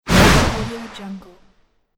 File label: whoosh force